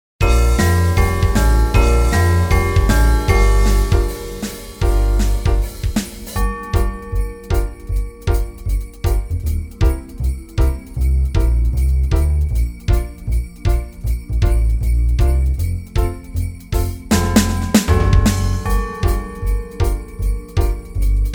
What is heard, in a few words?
Karaoke Bb